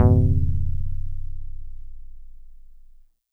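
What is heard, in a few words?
StarPiano DX100 C#0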